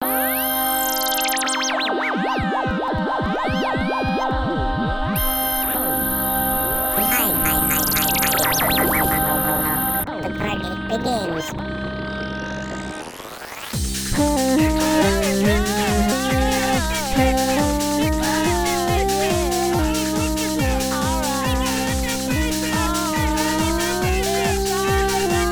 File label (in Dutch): Lekkere Leuke Techno